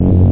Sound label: VAPOR